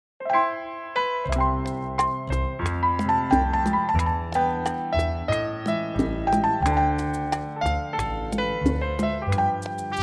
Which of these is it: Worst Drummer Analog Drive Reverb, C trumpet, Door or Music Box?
C trumpet